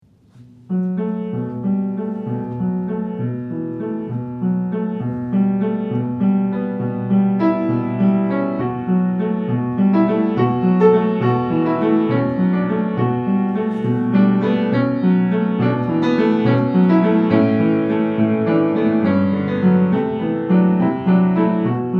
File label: piano